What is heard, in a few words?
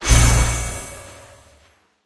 teleport end; smite; heimdallr